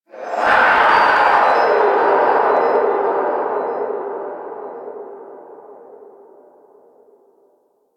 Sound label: Stadium Infested Medium